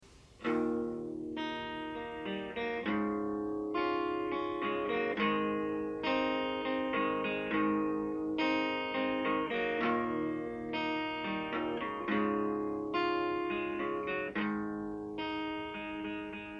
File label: unplugged